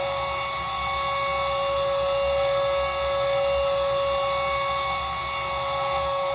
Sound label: stabilizer, engines tanks; War Thunder